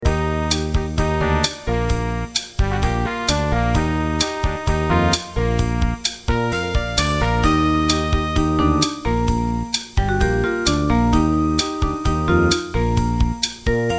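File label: synthesizer; Cuir Girls; djingle transitoire, programmation midi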